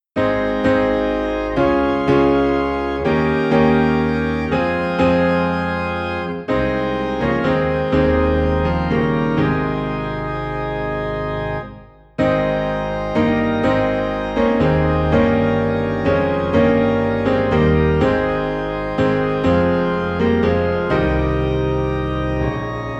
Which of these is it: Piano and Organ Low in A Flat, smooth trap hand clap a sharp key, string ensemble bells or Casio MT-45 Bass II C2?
Piano and Organ Low in A Flat